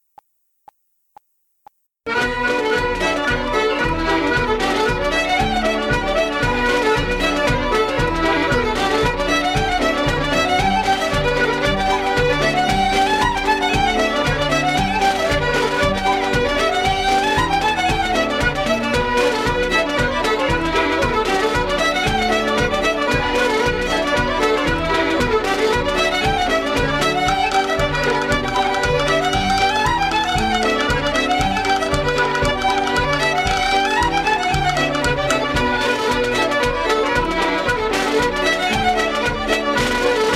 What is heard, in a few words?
Traditional Irish Reels Medley, Em; backing track